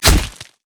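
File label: armadillo basic attack; rammus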